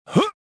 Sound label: jump; rebel